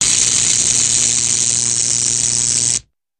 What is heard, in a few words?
Long Electric Sizzl, zaps